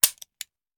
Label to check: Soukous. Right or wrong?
Wrong